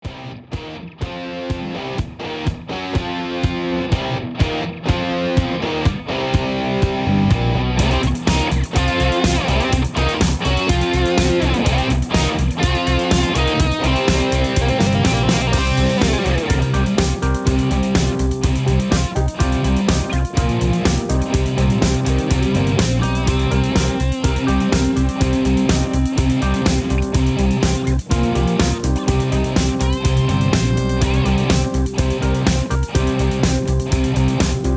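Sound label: Instrumental without background vocals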